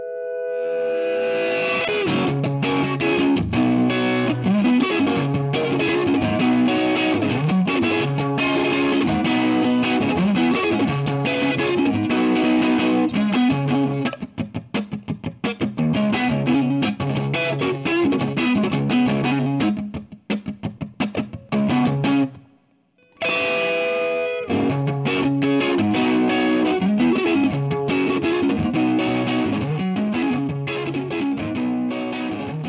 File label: Fire